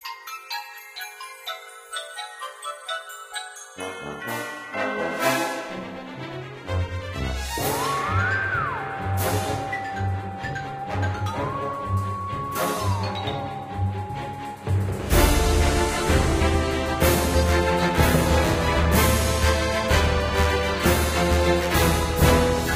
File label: Main menu music; Diner Dash Flo Through Time